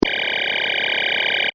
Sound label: Scanner Beam Ray, Scifi; Mechanical, Switch, Science, Fiction; Tech; BlastWave